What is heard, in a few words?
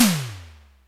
TOM, Simmons SD-8 Drums